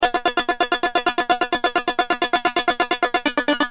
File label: Keys, Industrial